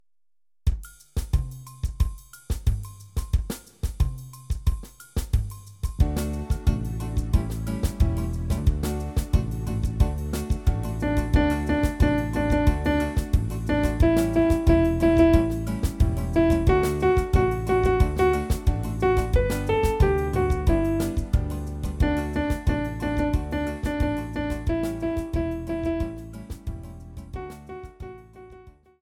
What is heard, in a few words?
instrumental